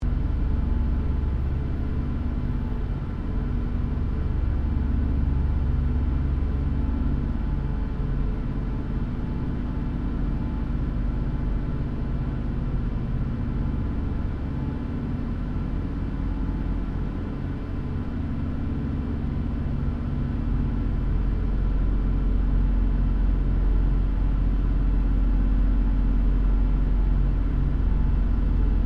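AIRCRAFT LOCKHEED L-1049 SUPER CONSTELLATION FLYING INTERIOR; 1955; Connie, Super Constellation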